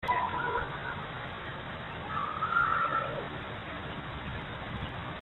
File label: Child yell